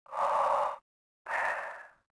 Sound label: breathing suit; Star Wars Knights Of The Old Republic II; XBOX